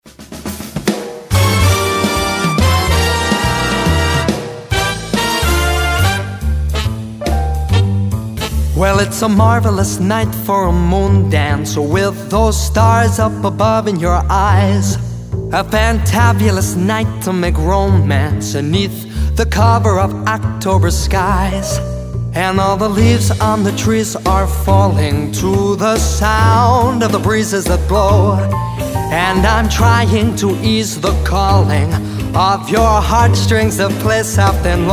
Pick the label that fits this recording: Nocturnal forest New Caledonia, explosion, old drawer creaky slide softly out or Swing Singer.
Swing Singer